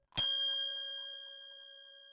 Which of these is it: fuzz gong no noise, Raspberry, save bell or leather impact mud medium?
save bell